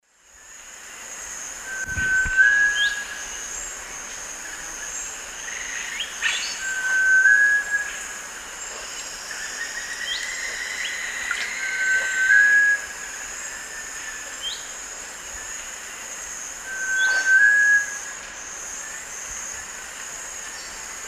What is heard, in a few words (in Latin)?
Eurypyga helias, song; S. America; Eastern Lowlands